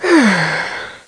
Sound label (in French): bruitage soulagement trousse